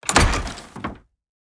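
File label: doors wood double light open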